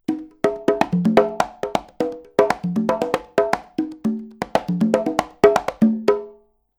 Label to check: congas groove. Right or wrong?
Right